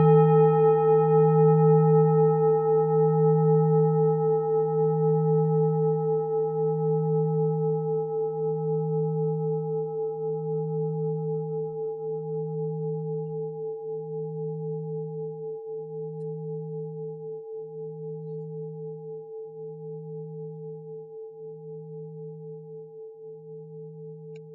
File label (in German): klangschale orissa